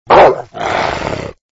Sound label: dogbark snarls